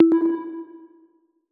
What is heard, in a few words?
sms tone ringtone; croatan